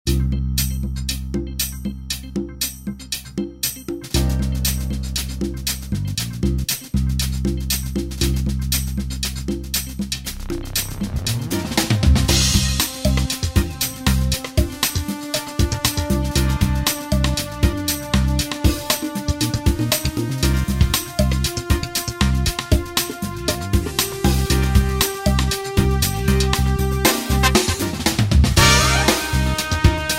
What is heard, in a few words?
Jazz